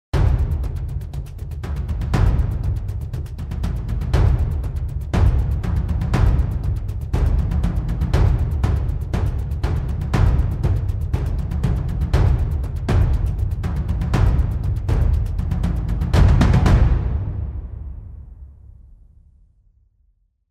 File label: Basic Accents 16th feel 5 Grundsounds; Dark City